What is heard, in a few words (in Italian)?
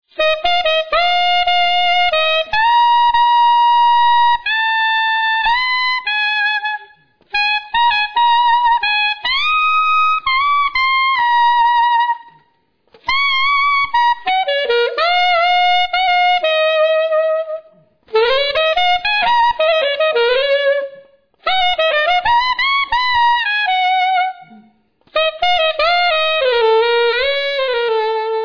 sovracuti, Sax